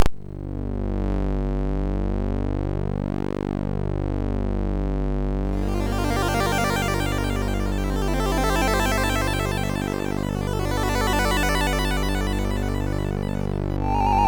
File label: Intro, MOS6581R2